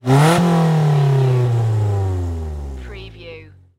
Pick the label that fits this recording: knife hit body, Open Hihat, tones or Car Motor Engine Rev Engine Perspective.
Car Motor Engine Rev Engine Perspective